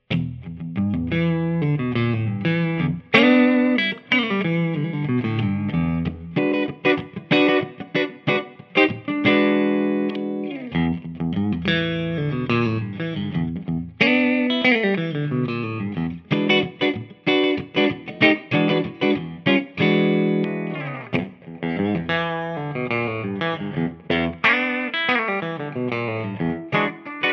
the nouveau series tiffany scarab, electric guitar; spalt instruments